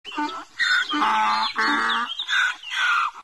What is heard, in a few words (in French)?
Âne